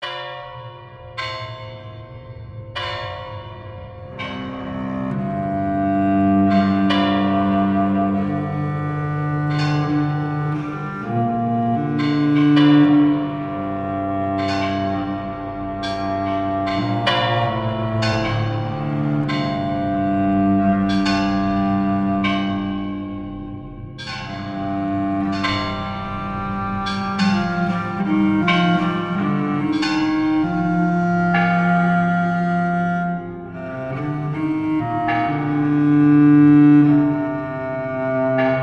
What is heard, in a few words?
Bass Viola da Gamba & Church Bells; Eduardo Tarilonte